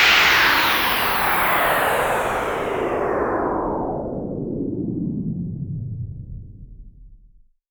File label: FX Downlifter